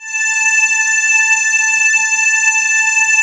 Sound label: cho a5, Choir Male Aah, SYNCLAVIER; Retro Sampler